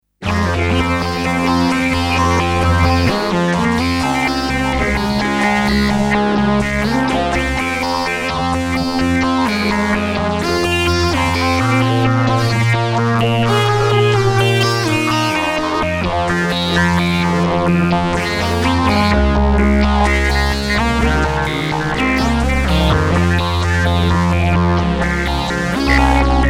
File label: chroma synthpop